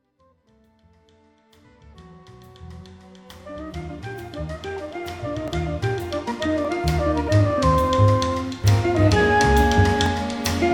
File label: Tarantella